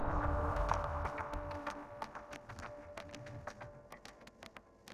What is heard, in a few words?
heartbeat